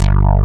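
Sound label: MOOG bass; JV 1080; Pro-Rec - Synthsonic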